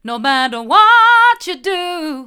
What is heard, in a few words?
hard, vocal